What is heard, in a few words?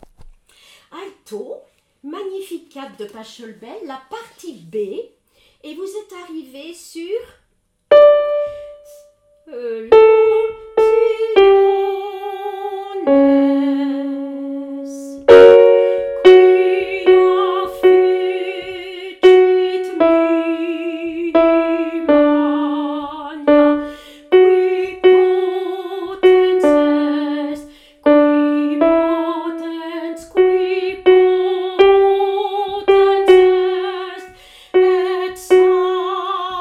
Magnificat Alto; Pachelbel